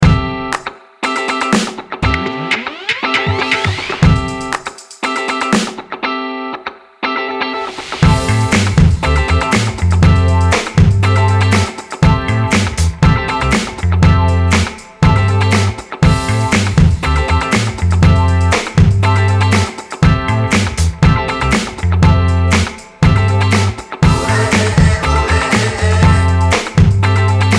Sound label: groove; Shops/Trendy; Disco/Funk; Happy; Youth/Party; Multimedia; Pop; Spring/Summer